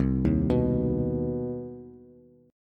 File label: C Minor Major 7th C Bass; arpeggiated; JGuitar